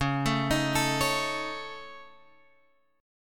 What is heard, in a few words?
C sharp Suspended 2nd Sharp 5th C sharp Guitar Drop D, chord